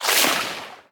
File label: skill sea shooting; agar agar